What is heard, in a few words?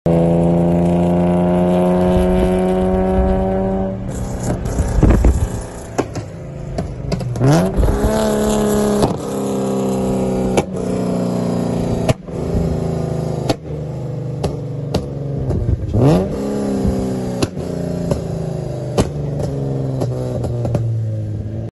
Genesis coupe